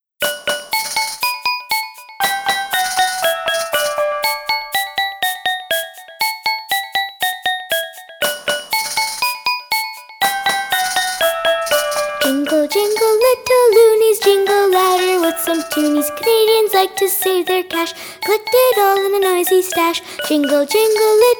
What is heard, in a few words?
Twinkle Loonies vocal